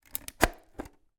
dustbuster handheld vacuum cleaner nozzle twist off